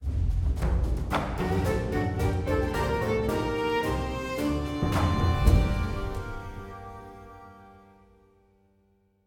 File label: String; assassin s creed unity gamerip 2014